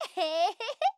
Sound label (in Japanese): えっへへへ